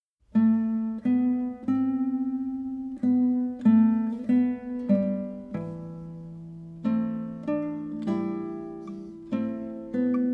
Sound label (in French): Sarabande pour guitare